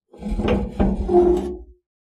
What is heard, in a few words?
metal gate latch; latches & bolts